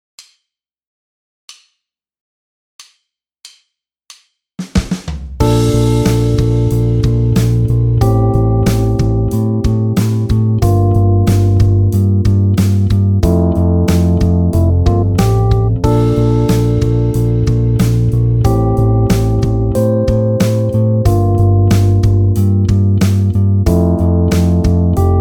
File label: cyclic chord